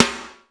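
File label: POWER KITS, snare; 90 sSampleCDs; Crypton - DrumBase5000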